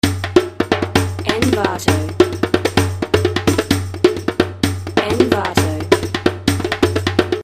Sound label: world music loops, 105